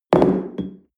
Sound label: espresso upset tablecloth hard short, restaurant cafe; sport-freizeit